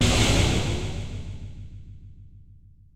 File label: fall down